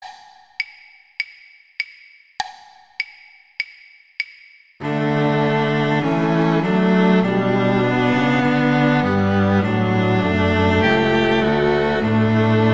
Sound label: Violin I; Quartet; Beethoven; Ode to Joy